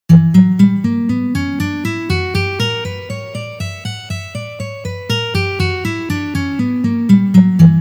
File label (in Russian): Gamma Si minor garmonicheskij vid pozitsiya